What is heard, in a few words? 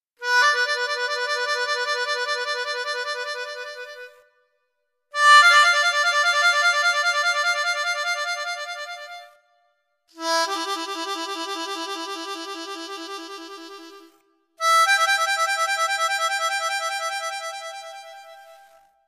Effects, harmonica